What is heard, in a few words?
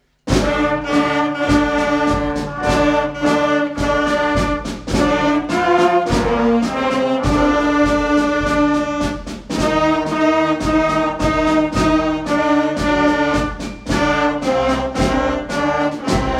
jingle bells